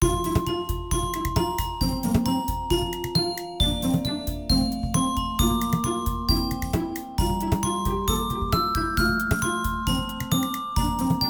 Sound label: music; exam night 5